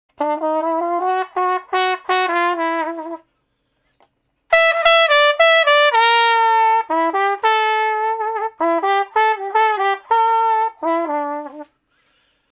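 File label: Trumpet Slow Blues (G V, IV, I, V) pickup 1 beat; Crimson Blue and Fabulous Horncraft 4 RnB; Horncraft for R&B; Solos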